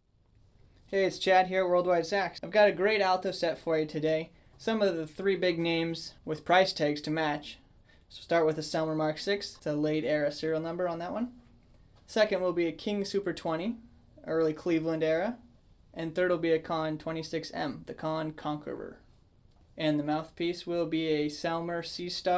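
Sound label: Alto vi super 20 6M, alto saxophone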